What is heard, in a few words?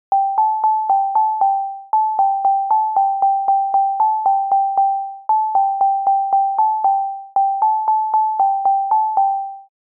scala tuned